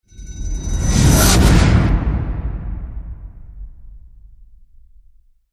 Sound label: Hit Bell Surge